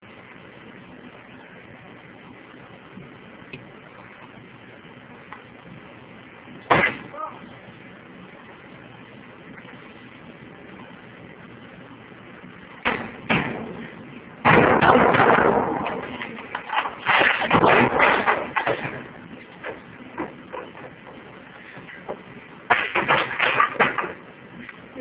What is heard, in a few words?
smash